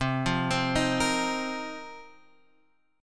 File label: Bb Suspended 2nd Suspended 4th C